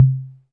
Roland RHY55, drum machine

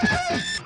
feedback hit; Weird and Interesting Hits

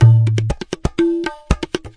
ROLAND SPD-20 PERC TABLA

